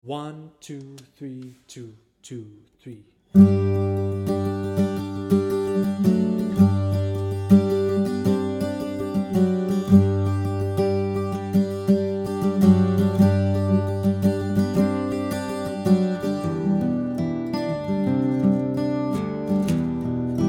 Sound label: Give Us A Drink Of Water, Guitar Full Speed